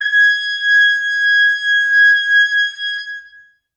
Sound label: brass acoustic, muted trumpet